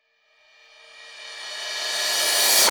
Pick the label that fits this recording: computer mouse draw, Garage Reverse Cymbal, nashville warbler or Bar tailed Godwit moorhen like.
Garage Reverse Cymbal